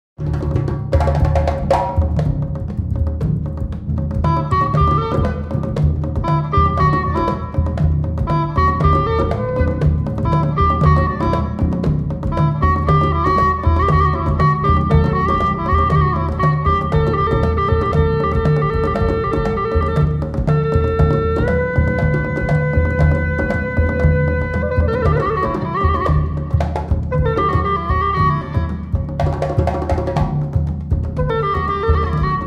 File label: Hulusi and Drums